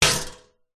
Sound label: medieval sword impact metal shield vibrate